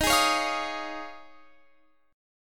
Eb 7th Suspended 2nd Suspended 4th Eb, Guitar Open F; Eb7sus2sus4; strummed